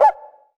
CUICA HI, SR-16 DRUMS; EdgeSounds

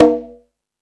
Latin Drums, E-MU Pro-Cussion

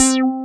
JV BASS, JV-1080; Syntec; Wall of Sounds VOL-2; BASIC-JV1080

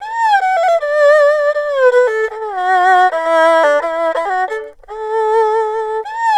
ERHU, 80; INIS - Opium

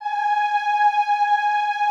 EEH G#4, Extended Classical Choir; Best Service

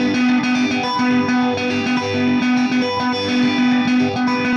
Arpeg Guitar; Session 02 - Clinical

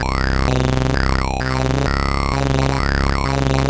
Sh101 Loop, 130BPM

